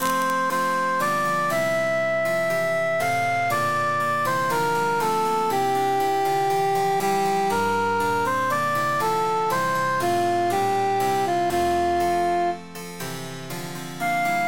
cantus emphasized; silver swan

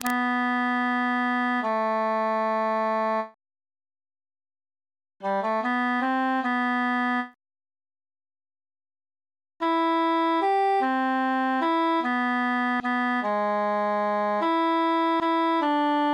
tenor solo

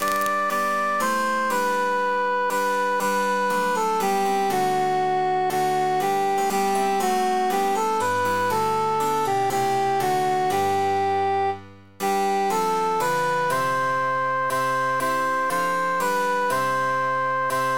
quintus emphasized; sing we and chant it

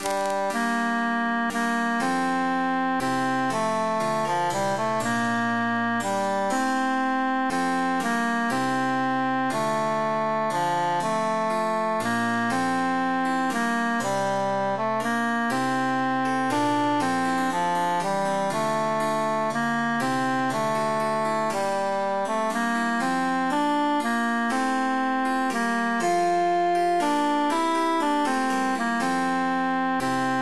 tenor emphasized; ach elslein

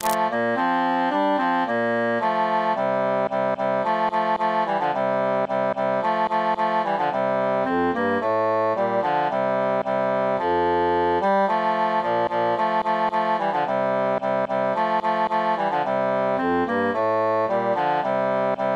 cantus mute; lo mio padre

